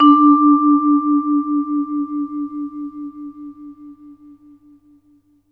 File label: VB VIFS D3-R, VB VIB FS; Best Service; Peter Siedlaczek's Advanced Orchestra